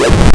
OUCH; Shadow Knights

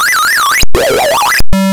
got part sound; Keen1

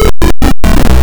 door open sound

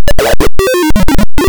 LVL DONE SND

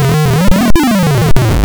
TELEPORT SND

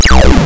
FIRE SND; Keen1

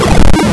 SHOT WALL; Dangerous Dave

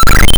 get card sound

